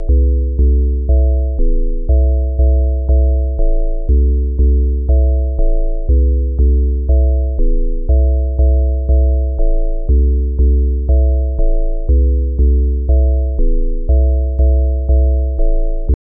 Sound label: 2 SEQUENCER; NoobSynth